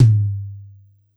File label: Jazz Tom 3, Yamaha PSR-E323, Jazz Kit